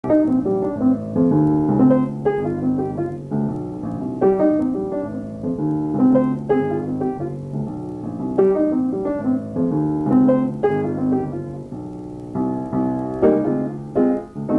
solo piano

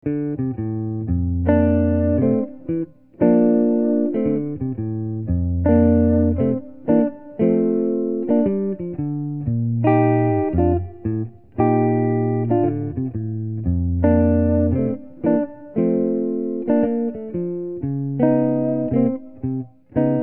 soft winds 3 gtrs

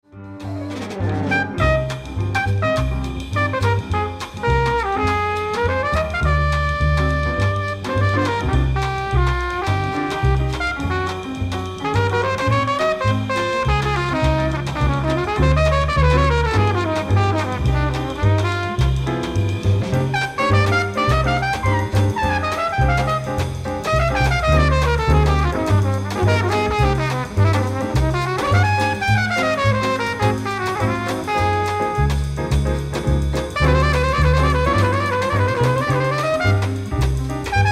solo

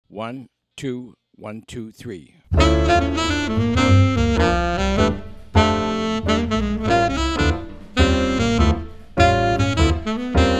minus drums

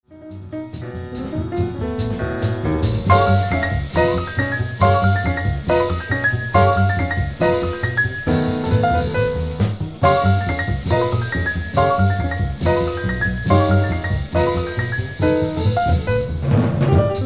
out melody

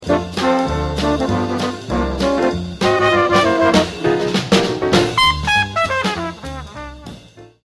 interlude

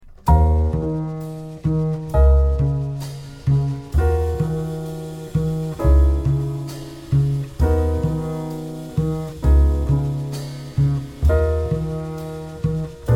minus melody

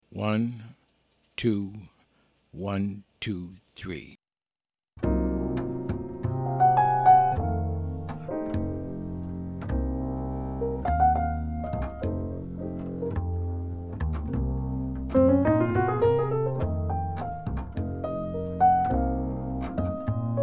minus drums

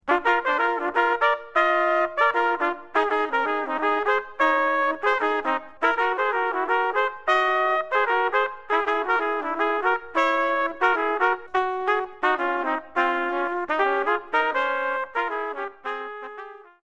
duet

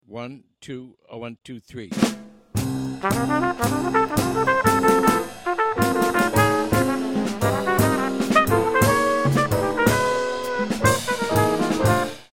MEDIUM minus bass

